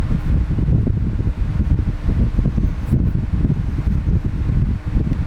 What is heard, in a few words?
wind internal; jvc gc-px100